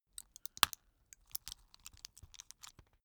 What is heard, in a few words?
plastic drug blister press out long